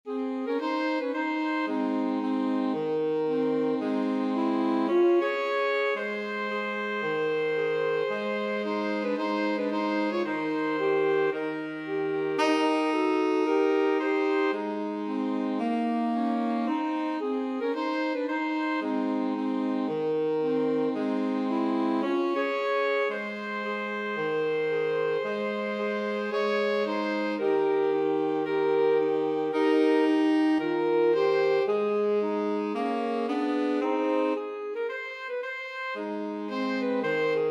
2ASAX TSAX, 2 altos tenor sax

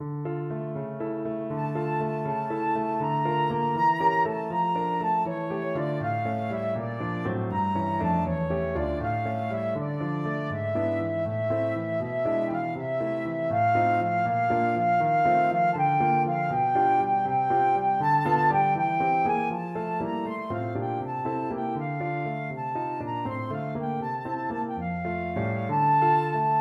scarlatti cessate, flute

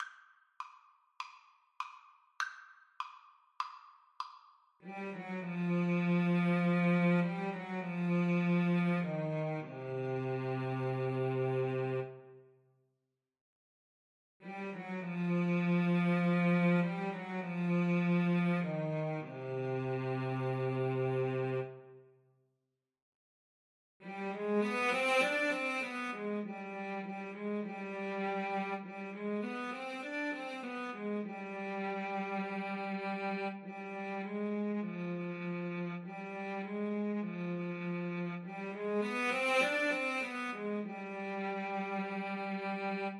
Cello Duet

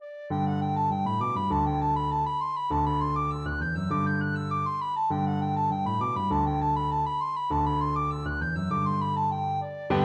recorder

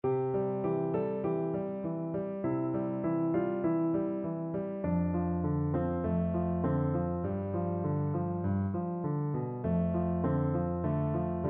songaji, piano

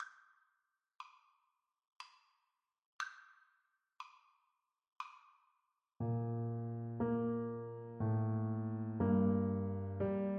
minuet, trumpet

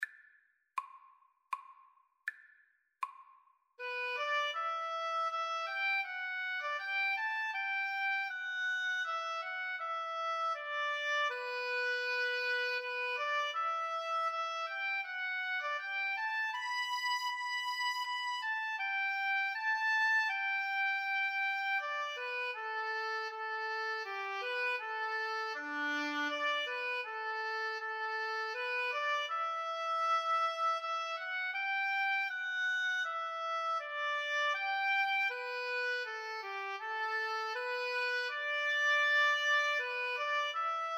holst jupiter, oboe violin

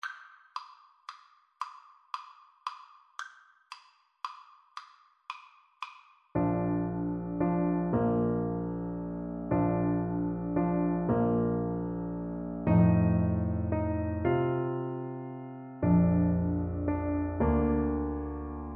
silent night, Baritone Saxophone

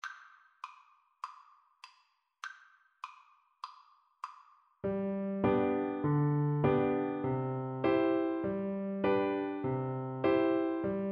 teapot, Double Bass

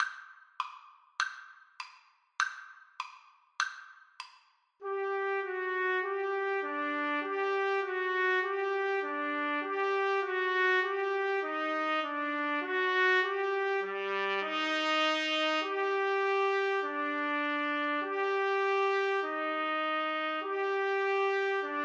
trumpet duet

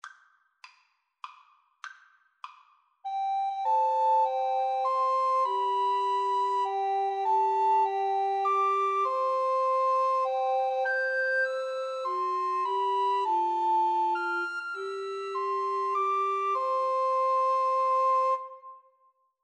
happy birthday, Recorder Trio

